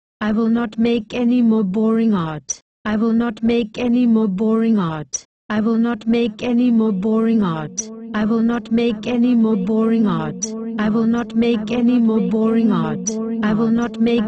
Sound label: VEENA